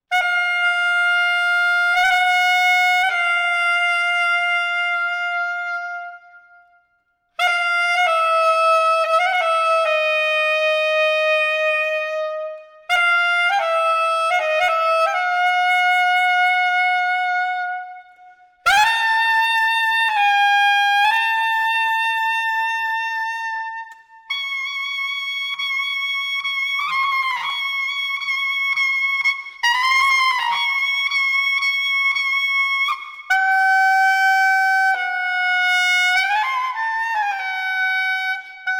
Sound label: basque mandel solo